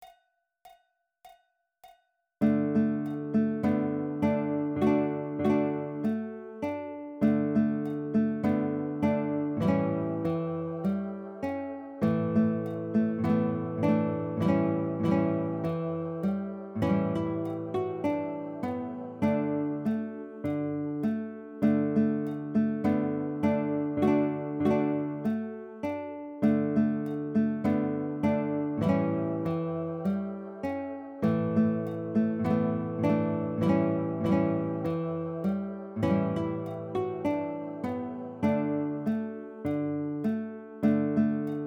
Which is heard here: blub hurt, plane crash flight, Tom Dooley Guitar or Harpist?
Tom Dooley Guitar